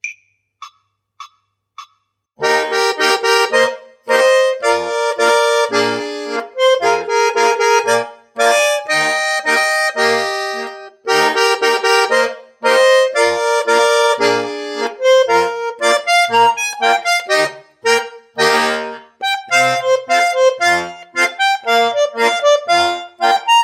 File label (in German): polka traditional; birewegge